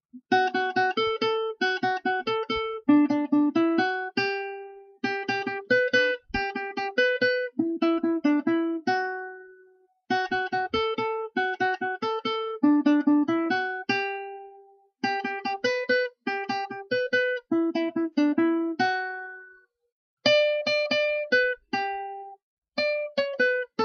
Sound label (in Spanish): compadre pancho solo